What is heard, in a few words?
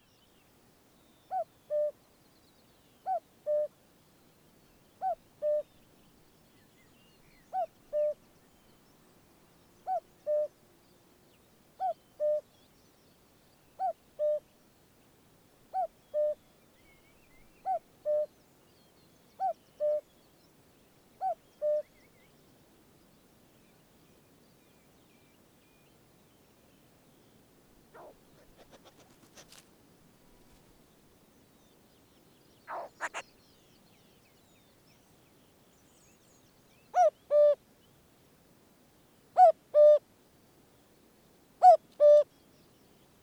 Common Cuckoo Cuculus canorus